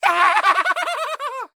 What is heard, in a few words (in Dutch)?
Clown Lachen